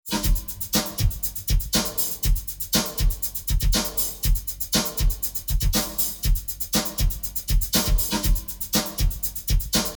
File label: drums azimuth 45 spread 0 incoherent; spread 60